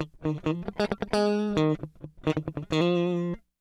133 Pop Guitar S-Note F maj, Electric; Pop Rock; Electric 100-160 bpm; Pro Tools Essential Loops; Digidesign

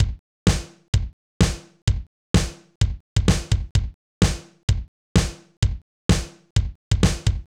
128 Pop Drums 4Floor; Pop Rock; Fast Tempo; Digidesign; Pro Tools; Pro Tools Essential Loops

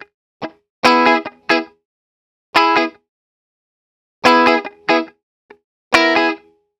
070 Pop Guitar Rhythm C# Min, Electric; Pop Rock; Electric 055-099 bpm; Pro Tools Essential Loops; Digidesign

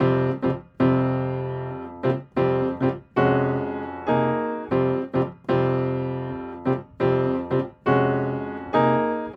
205 Trad Jazz Piano Fmaj, Grand Piano; Digidesign; Pro Tools Essential Loops

